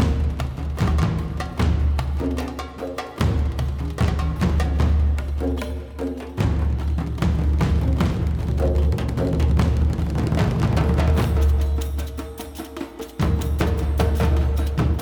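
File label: Percussion loop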